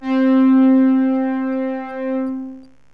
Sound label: JUPITER, SYNTH; JUPITER6